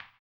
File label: HAMBONE, Vocal Blues Soul; Spectrasonics; Planet